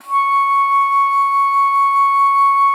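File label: C#6, bass flute; Xsample VOL-2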